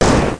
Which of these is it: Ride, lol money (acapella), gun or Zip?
gun